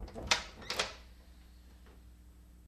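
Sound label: Door Open Latch Quiet; latches & bolts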